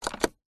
vehicles car suv nissan patrol diesel 1998 internal dash button press